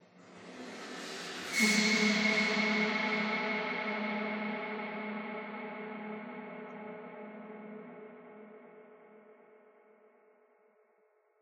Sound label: Interior Metal; The Sinking City